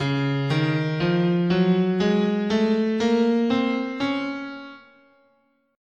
C sharp Bebop Major, scale

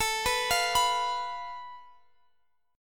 F Major Flat 5th A, guitar chord

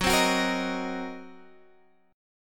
Fsharp 6th Flat 5th down Guitar DADGAD

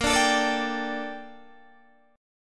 A sharp Major 13th, Guitar Open D

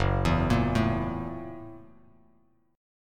F sharp 7th Sharp 9th F sharp down Bass Standard; arpeggiated; JGuitar

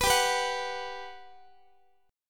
G Major 7th, G, down, Guitar; Listen to GM7 strummed; GM7 Chord

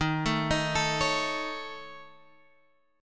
Db Suspended 2nd Sharp 5th

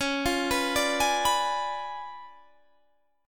Db 7th Flat 9th, Guitar, Standard; Db7b9 arpeggiated; JGuitar

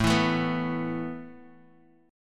E Suspended 4th

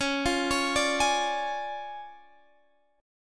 D Minor Major 7th Flat 5th C sharp, guitar

